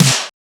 Snare; FLPRO

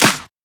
Shifted Clap; FLPRO

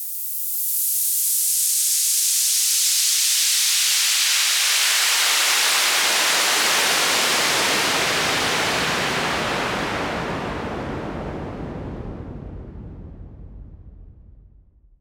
Fall Clean, Falls

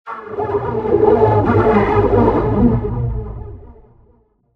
in Techno

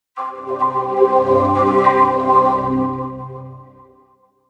Windows XP Startup Sound in Aztec

